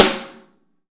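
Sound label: snare ambient; Best Service; Real Mega Drums VOL-1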